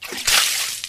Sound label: splash